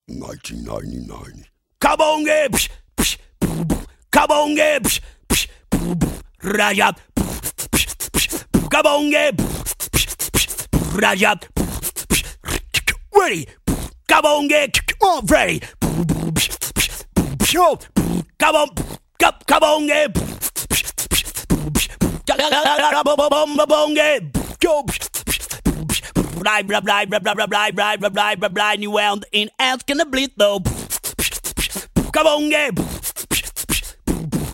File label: beat box